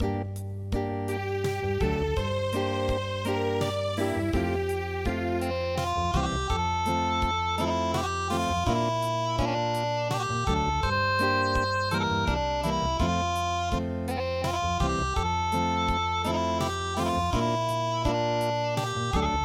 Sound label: Swiss Acoustic; traditional